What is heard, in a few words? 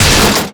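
crowbar hit body robot; melee impacts; Garry's Mod; tf2; mvm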